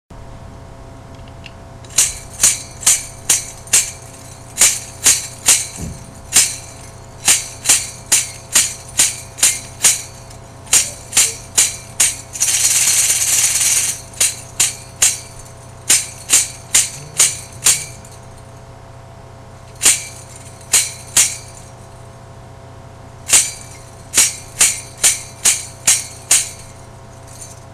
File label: perc